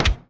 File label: Football ball hits wall